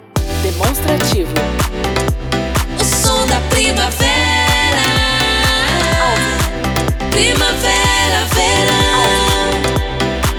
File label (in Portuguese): vinheta primavera, vinhetas cantadas; PACOTE PRIMAVERA VERAO 2025; ALVIM PRODUTORA